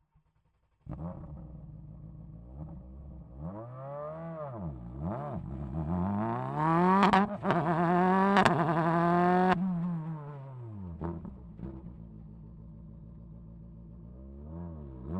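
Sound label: Skoda Fabia R5 - onboard - FAST - Start Drive Stop Reverse Stop Drive Stop - EXHAUST, Rally Car; Skoda Fabia R5 WRC2 Rally Car 2020; Pole Position Production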